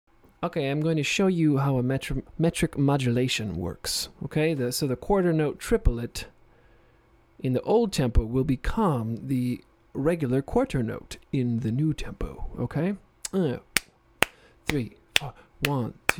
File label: metric modulation